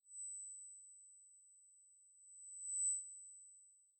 Light At The End Sine

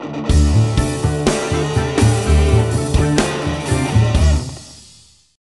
Rock; Worms World Party

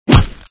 Hit; Chibi Knight

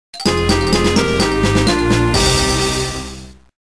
Loss [Final Boss]; Spy Muppets: License to Croak; GBA

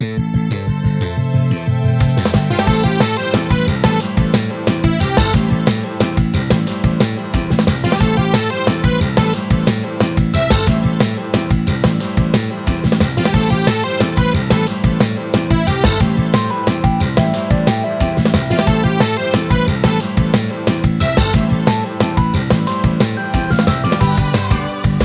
Battle; Suzukuri Karinchan; Slap Battle

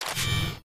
Raygun; criminality

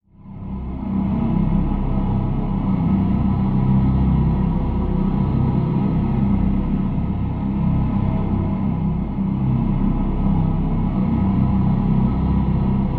drone vox low scary

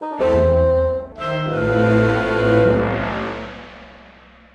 BGM Lose; Mini Warriors; android

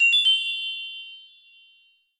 chime; Plants vs. Zombies

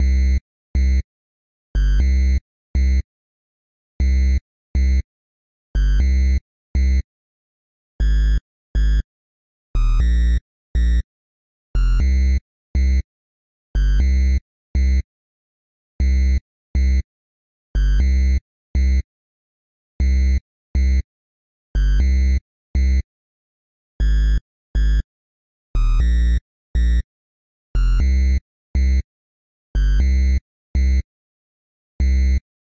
Tutorial Bass

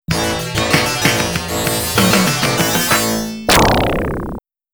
Game Start

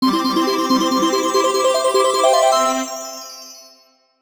Collect Banana Medal-Battle Crown; Donkey Kong 64

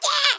rat victory; Rats

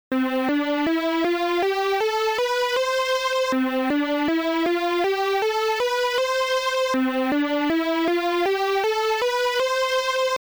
Speaker Test; Fighting Layer

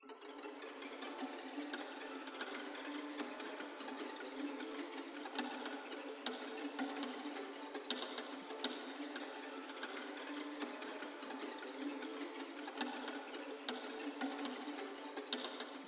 Boss Combat Crab Spawn

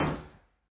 Close door; papas cupcakeria online windows gamerip 2013

